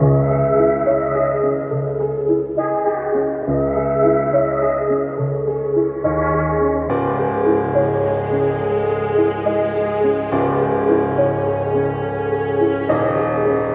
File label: piano and strings, Sad Loops